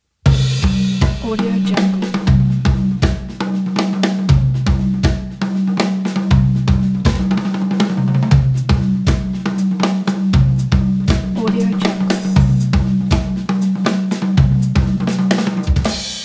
the drums solo

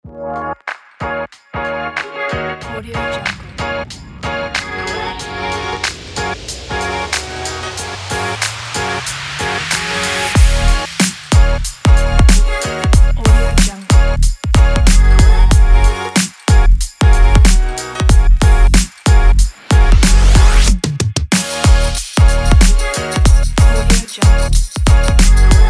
upbeat hip hop energy kit; stereo jam

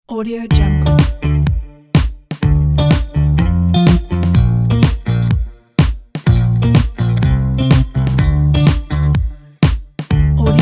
fun and funk groove

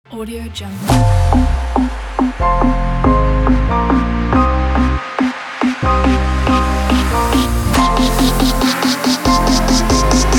the future bass; oddvision

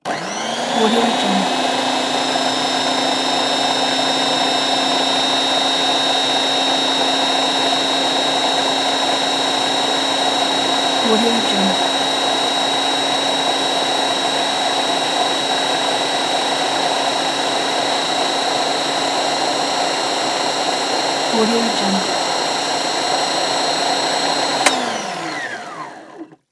electric blender; vintervarg

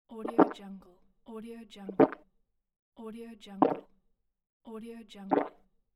drink potion; karatturmenko

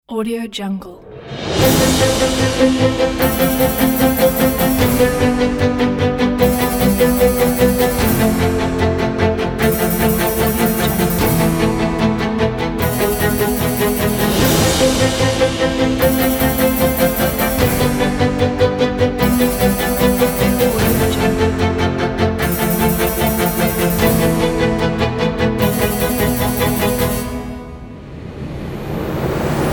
documentary investigation; pianissimo-composer

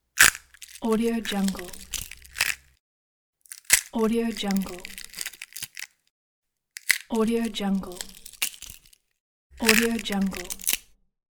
egg cracking

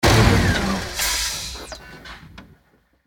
futuristic engine failure

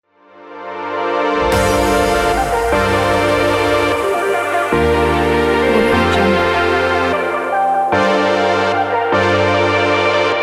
future bass dubstep

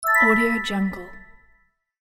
notification

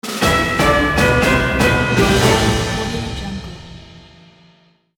dramatic orchestral breaking news jingle

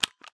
metal staple gun slider unlock button; esmteam

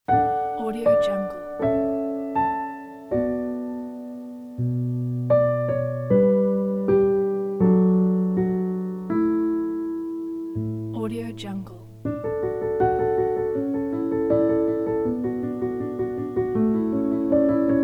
emotional piano ident

